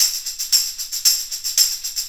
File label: Tambourine